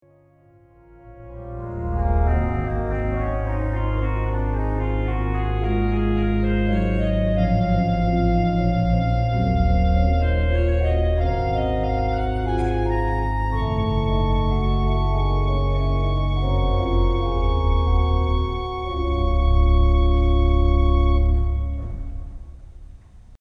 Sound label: oboe